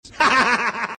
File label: laugh; lego batman